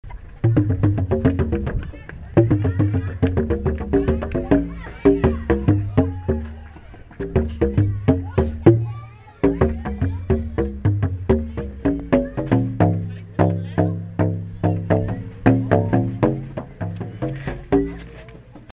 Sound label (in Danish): klaporgel